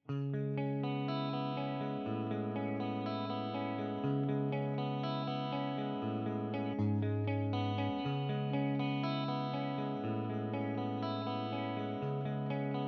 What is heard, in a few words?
Epiphone ES335 CLEAN both